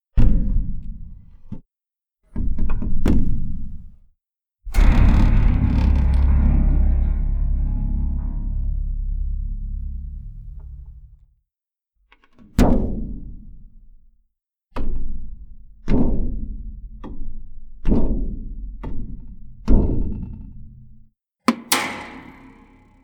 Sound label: Toy Drum, Drum Kit Kicks